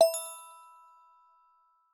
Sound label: Nokia Message